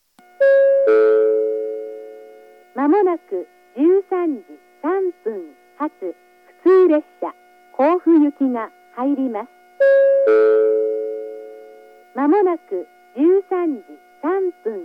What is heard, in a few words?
local, station; shinano-sakai; kofu; jr-east